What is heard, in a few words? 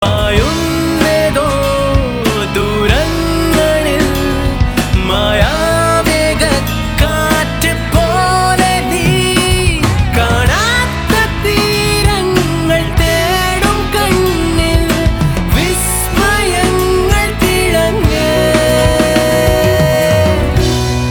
Love Ringtone